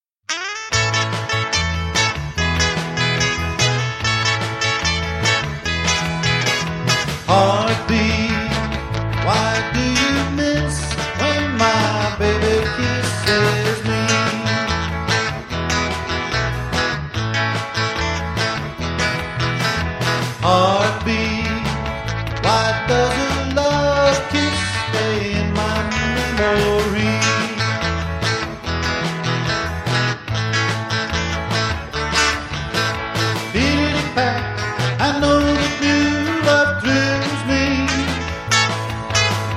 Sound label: Heartbeat